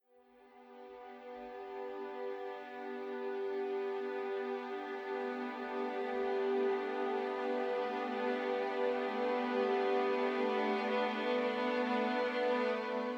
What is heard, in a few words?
Fragile String Evolutions